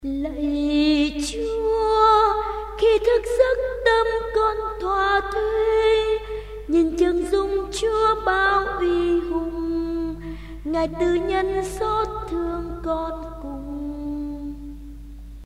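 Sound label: soprano tenor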